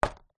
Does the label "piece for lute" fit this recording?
No